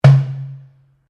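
Drum Darabuka Palm Hit forte, Egyptian Darabuka Drum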